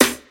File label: clear snare drum sound c key